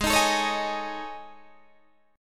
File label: A Major 7th Flat 5th